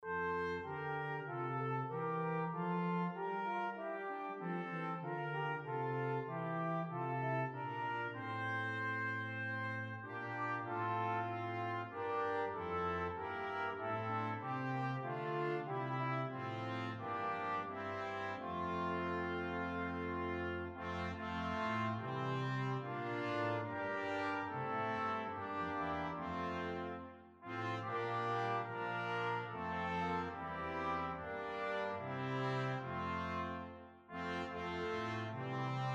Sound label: Brass Quintet; Liedboek; Zing nu de Heer stem allen in